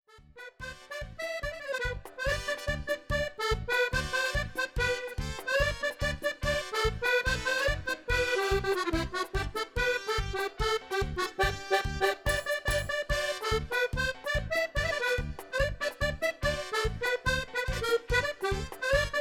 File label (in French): extrait merengue latino